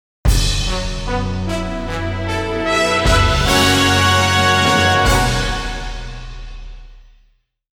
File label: Rush chance fanfare; Castlevania